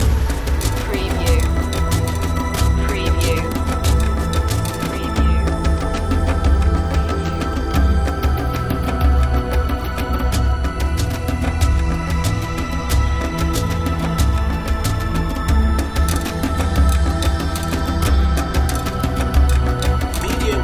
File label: tribal future loop